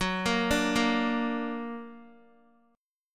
F sharp Augmented F sharp Guitar; x,9,8,7,10,x; arpeggiated

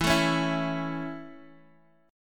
A Major E

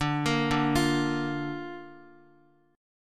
D Minor Sharp 5th, D, down, Guitar; Dm#5 arpeggiated; JGuitar

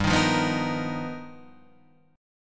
G 7th Sharp 9th Sharp 5th, G, guitar chord; G7#9#5 strummed

